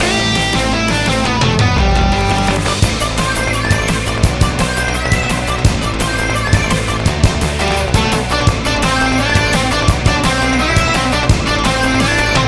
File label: Bravery Run, bpm170, Uplifting electric guitar and synthesizer music